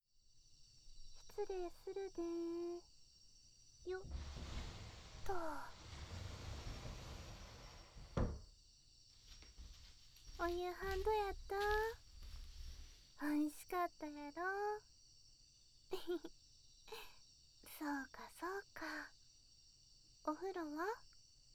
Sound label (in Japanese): 肩マッサージ、肩たたき; 耳かき旅館 猫屋敷 ～茶虎～